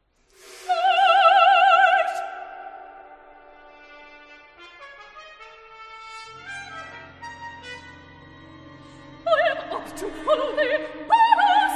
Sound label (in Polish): na sopran i orkiestre